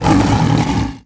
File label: shark roar; Tony Hawk's Project 8; XBOX